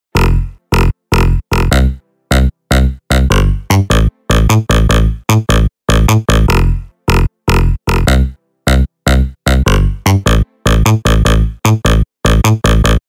Bass Electro

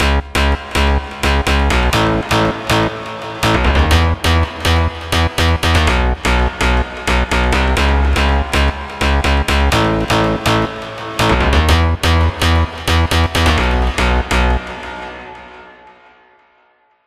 BASS FUTURE

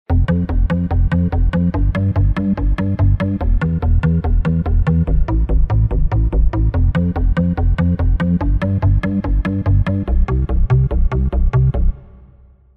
Bass House

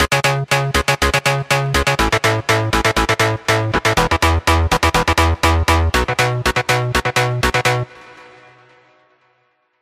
FUTURE BASS

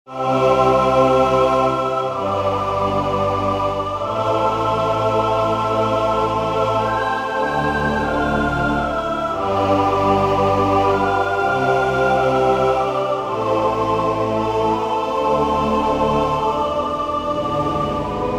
Roland XP

